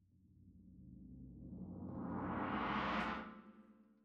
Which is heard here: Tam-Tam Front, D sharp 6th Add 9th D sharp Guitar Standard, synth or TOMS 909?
Tam-Tam Front